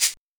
Oberheim DX

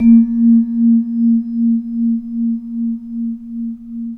VIBRAPHONE C; Synclavier Percussion; World & Orchestral; ILIO

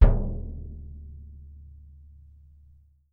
BD MF, Percussion

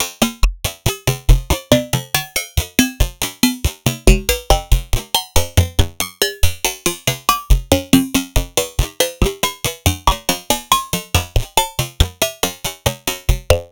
Phase Plant, bass

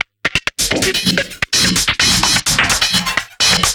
Perc 128; musicradar

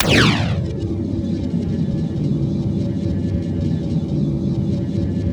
MISSILE, WARHEADS; Spectrasonic Distorted Reality 1; WARHEADS 2

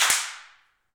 Handclaps; Roland LCDP14 Africa VOL-2; Afr. Hands

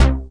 HARLEM BASS C4; Best Service Dream Experience

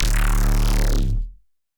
neurobass D

